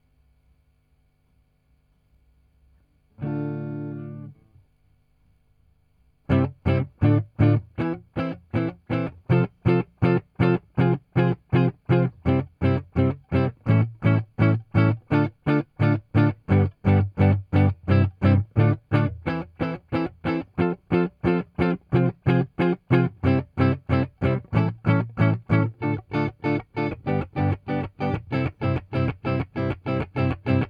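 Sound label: Guitar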